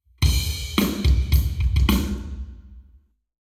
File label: Hall, Drums 0deg; eBreve